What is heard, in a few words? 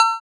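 percussion bell, jersey club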